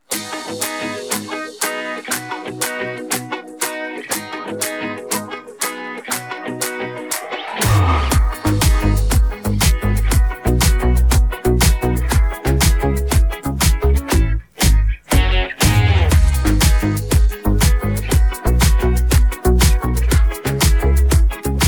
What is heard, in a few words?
instrumental; persian